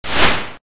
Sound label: eat snowflake